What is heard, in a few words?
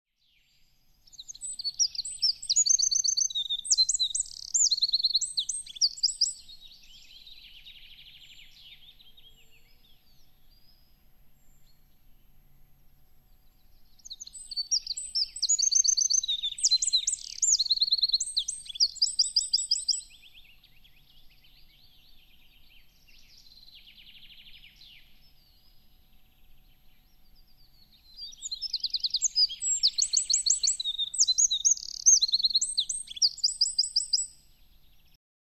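wren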